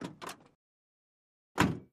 Ambulance Door

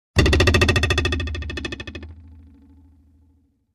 Sword Twang Sword Pl

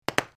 Plastic Pops, bubble-wrap

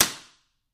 Belt Hit Slap Flat

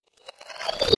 Digital Text Stinger

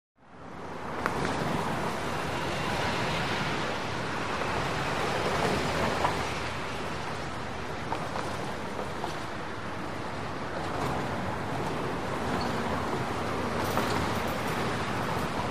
Medium Busy Traffic, big city

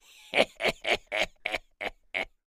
laugh evil male

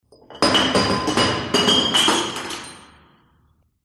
Bottle Rolls Down Echo

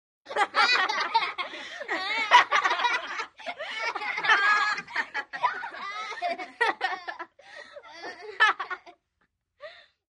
Five Kids Laugh

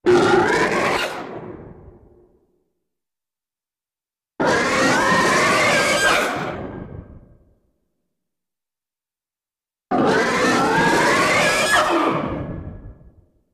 Big Rev Monster Snors

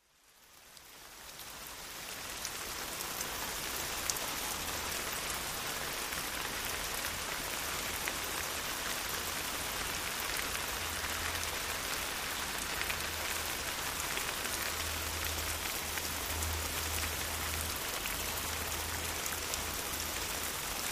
Rain Fall On Foliage

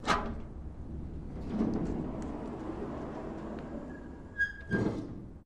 Garage Door Metal, garage

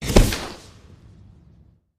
Potion Explosive Pof, explos

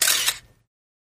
35mm Camera Auto Win; shutters & movement

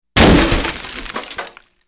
Rock Hits Metal Debri; rock impacts

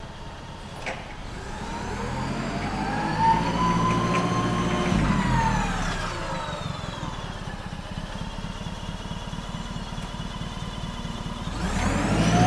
Forklift In, Stop, Out

